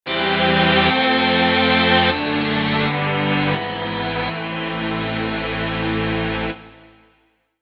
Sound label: Tron Strings